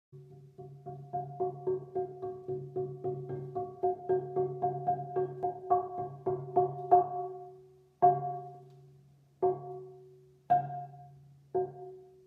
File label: Semantron